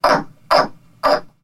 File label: Frog California Tree